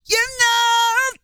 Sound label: REGGAE, Vocal Planet World Voices